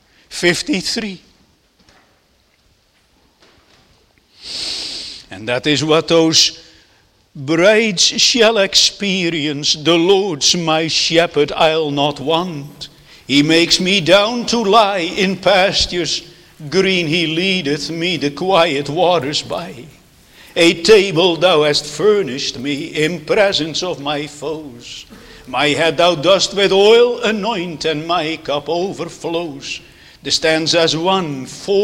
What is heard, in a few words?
Singing